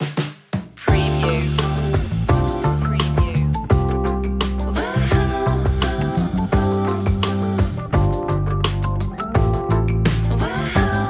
sweet honey stinger

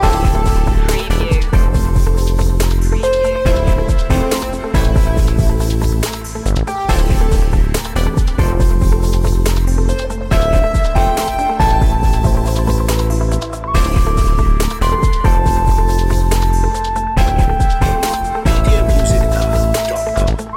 urban groove loop

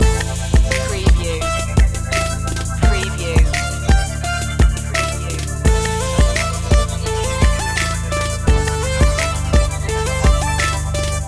radio hip hop loop

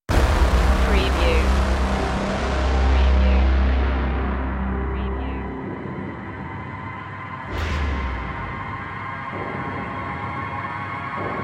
industrial impact

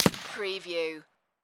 Rifle Remington 7mm Single Shot Exterior At 75ft

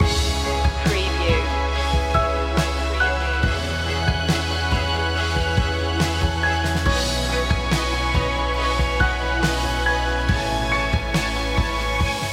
epic strings

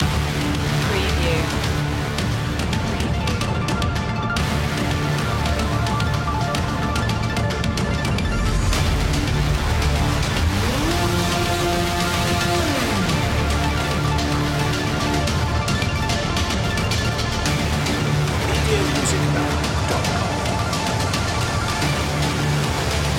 dystopian machine

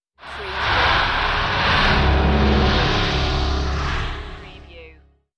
Dark Production Whoosh

